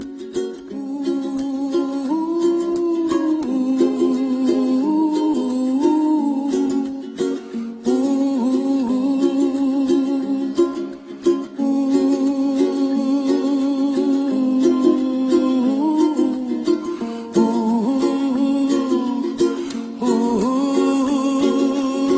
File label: Rainbow Hum